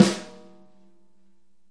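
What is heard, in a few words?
Snare A3; REAL LIVE KITS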